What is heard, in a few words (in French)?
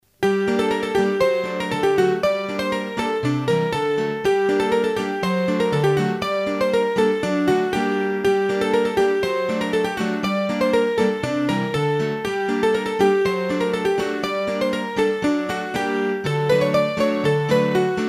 Bourree Parisienne, hurdy gurdy; Bourrees a 2 Temp